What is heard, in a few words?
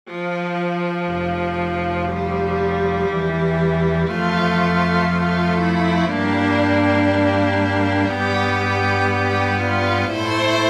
chorale for string ensemble; bach johann sebastian; bist christus sage uns